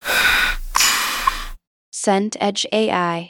breath out

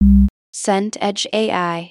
error soft

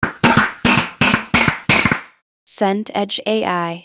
hammer nail series

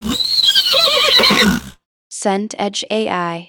horse neigh

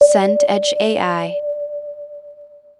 radar ping

